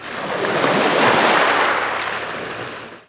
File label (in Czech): Vlny; wob2 demo